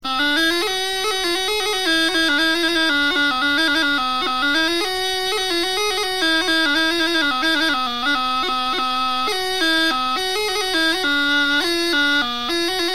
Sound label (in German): gaitachanter melodie